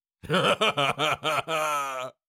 Cartoon lion voice laugh laughter

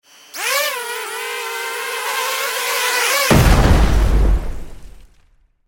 Military drone flight and explosion; style 2 short; misc explosions; gfxsounds

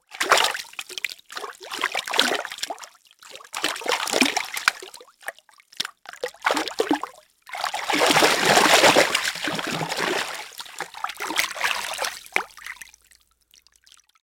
Swim in swimming pool close perspective

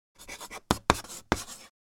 Writing with chalk on a chalkboard

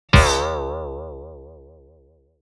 Cartoon accent impact wobble

